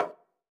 hit Soft, roulette; advanced roulette

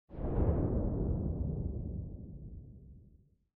freespin; elements

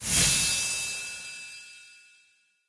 win attention; goldentavern

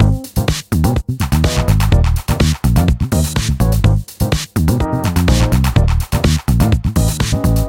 music loop base; disco danny

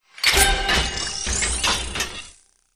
place Scatter In Mechanism; lost relics

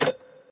button click; eggomatic

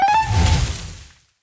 Knight Meter Fill; knight rider

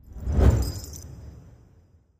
symbol In; wild worlds

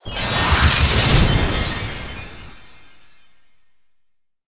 fairy Throw; fairyred

